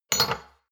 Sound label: shot glasses stack medium; soundpool